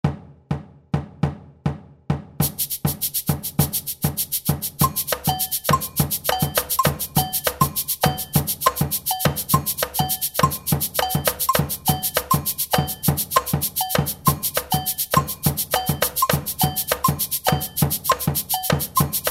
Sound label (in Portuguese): batucada